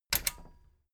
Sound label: metal button stereo click disable heavy